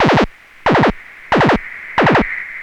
monodelay, synth loops

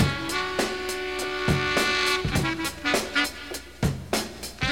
102 bpm modern drum loop sample c sharp key

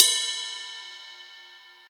huge ride cymbal drum sample B key

